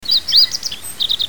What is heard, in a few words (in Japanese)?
hoojiro